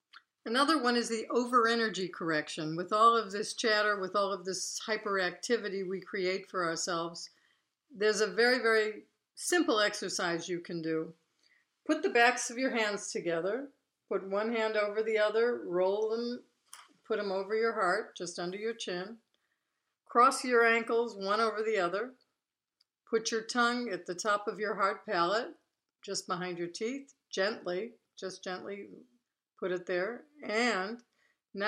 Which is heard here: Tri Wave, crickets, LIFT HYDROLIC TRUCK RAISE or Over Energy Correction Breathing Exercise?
Over Energy Correction Breathing Exercise